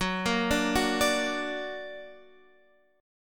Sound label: F sharp Augmented F sharp Guitar Half Step Down